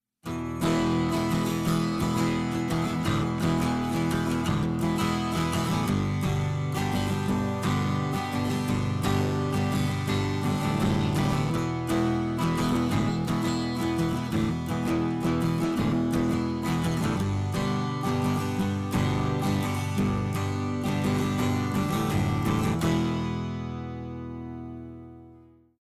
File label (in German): Guit, Niere, Preamp, Input voll übersteuert; Orpheus